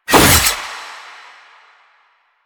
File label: Sickle Whoosh; Warframe; Lasrian; Lasrian Soldiers; Weapons; Lasrian Butcher Sickle